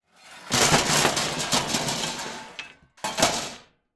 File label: Crashes Metal Debris On Wood